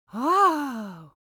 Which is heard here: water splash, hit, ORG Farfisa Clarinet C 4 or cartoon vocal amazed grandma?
cartoon vocal amazed grandma